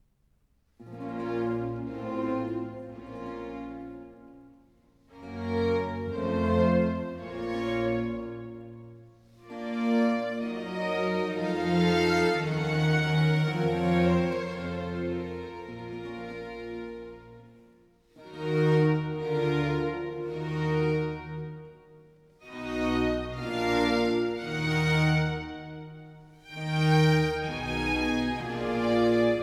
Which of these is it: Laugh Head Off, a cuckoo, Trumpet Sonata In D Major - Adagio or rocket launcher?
Trumpet Sonata In D Major - Adagio